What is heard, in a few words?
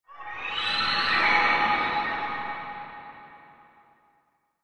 Sci Fi Creature Howl High Ethereal; Alien; Blastwave